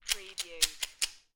Poker Chips Rattle Chips In Hand

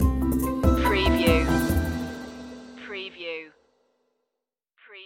enter out stinger

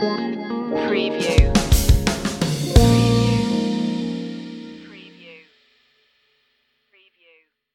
funky love stinger

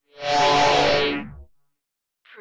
drone slide beam

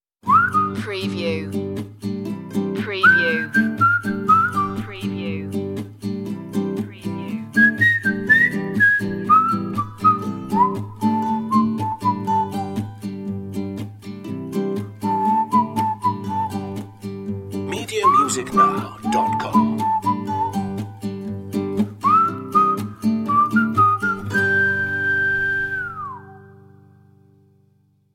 whistle version

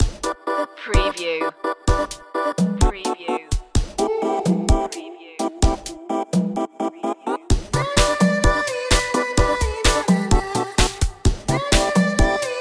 happy angel 128bpm

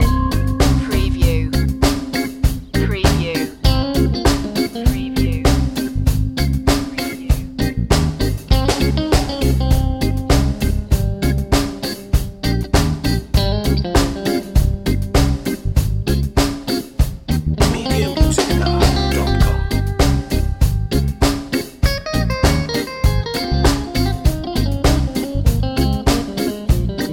one stop reggae loop

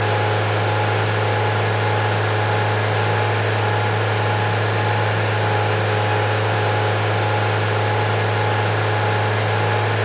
Car Mazda 3 Medium Rpm Loop

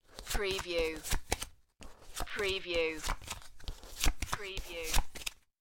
Cards Playing Cards Dealing

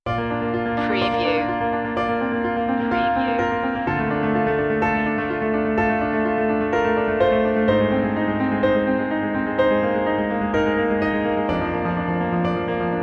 piano solo

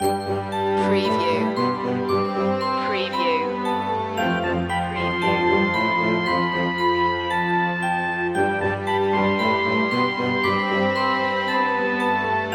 xmas loop

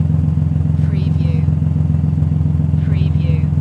Power Car Idle Loop Short

